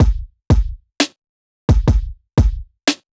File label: Drum Sequenz